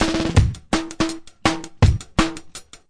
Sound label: 32nd snare break, 165 bpm; 161 to 180 bpm